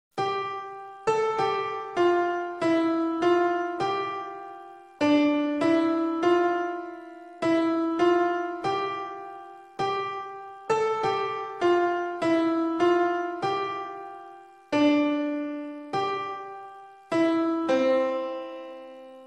soft music tone

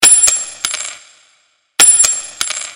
Coin Drop Sms

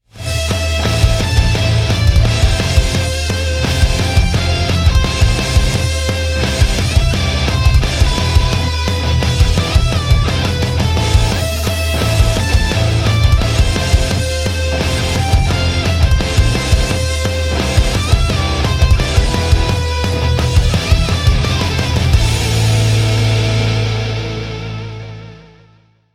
Riff Outro

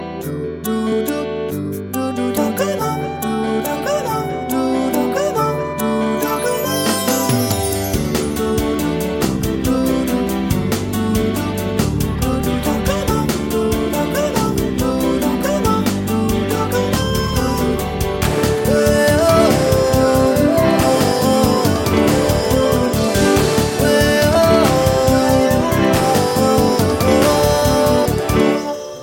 Trance; Docomo

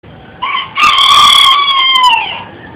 Defective Kikiriki

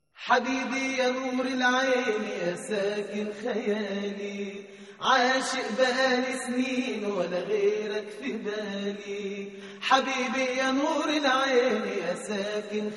Habibi Arabic

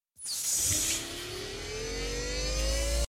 Car Race

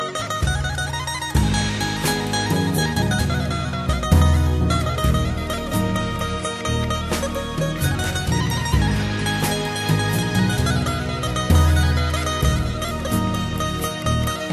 Qiftelia

